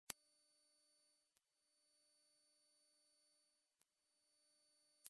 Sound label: ringtone